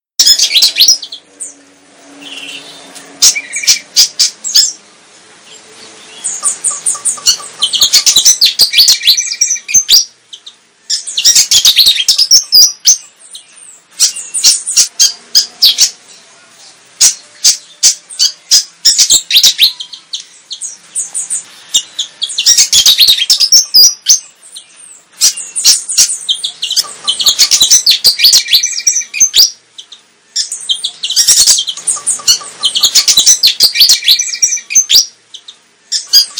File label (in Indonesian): suara tembakan sikatan jawa gacor